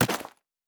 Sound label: Build Interface